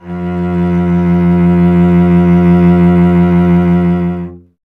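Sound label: strings cello F; Miroslav Vitous; Solo Instruments VOL-1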